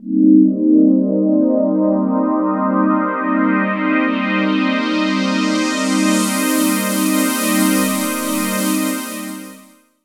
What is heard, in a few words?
Cmin 7th, Pads; Jungle Warfare 1&2